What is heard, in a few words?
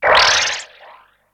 Lazer; knight rider